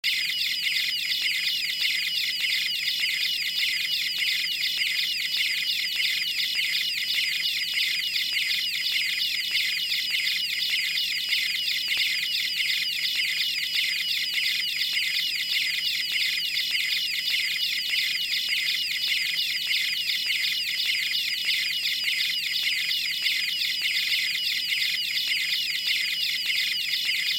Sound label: tiger thrush